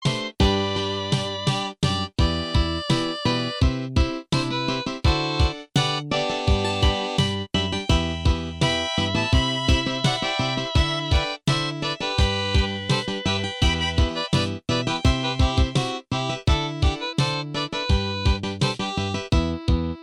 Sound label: harp